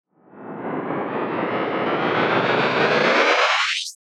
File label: Movements; 99Sounds; The Weird Side Samples